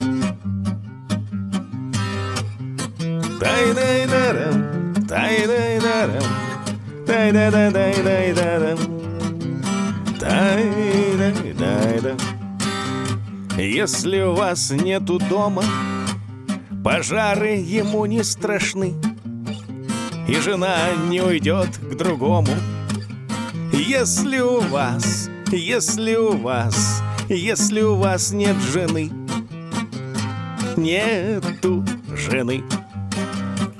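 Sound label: vocal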